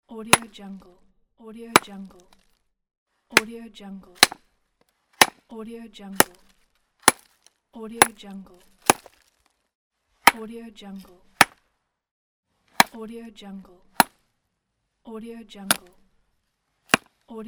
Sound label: hammering on rock